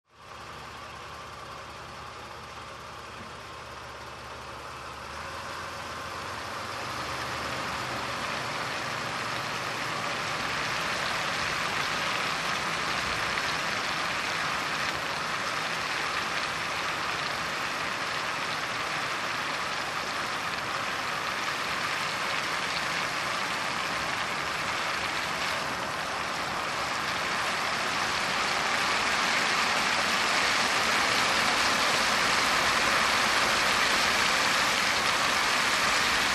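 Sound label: car driving on wet road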